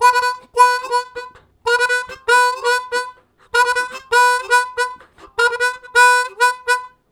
Staccato, Harmonica; Zero G - Harmonica; Riffs; Staccatoey

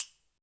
PRC COIL, Perc Toys; Spectrasonics; Supreme Beats; World Dance

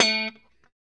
POP A 3, STRAT guitar; Spectrasonics; Hans Zimmer Guitars VOL-1; JC-STRAT POP

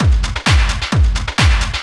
techno, DRUMLOOPS; Ueberschall; Techno Trance Essentials

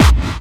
KIT, Dance Mega Drums; Best Service

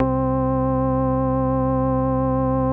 C2, organs; Music Store Sound Service; Einstein

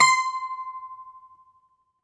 Greek Bazouki, Plucked Strings

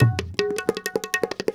INDIA R, IND ENS PH, Heart of Asia

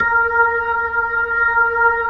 B-3 Jazz A#5; Keyboards of The 60's and 70's

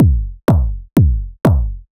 Strip 124bpm, House Drumloops; Zero-G House Fabrik; 90 s Sample CDs

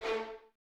Violins A 3, String Ensembles; Miroslav Vitous

